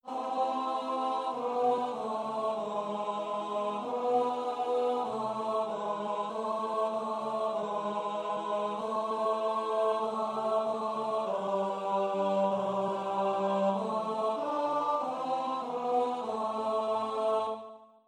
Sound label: Psalm tenor